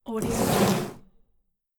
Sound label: old sailboat hemp rope drag on wood